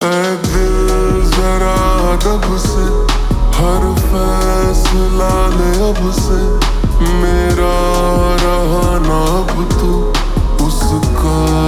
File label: Slowed Reverb